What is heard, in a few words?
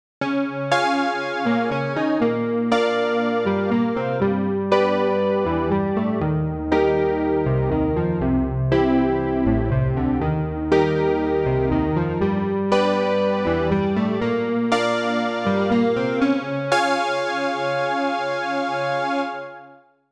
Struck String, Roland JU-06; strings; SoundDiviner Project; Roland JU-06 Pack04